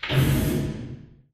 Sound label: radar tower servo; Titanfall 2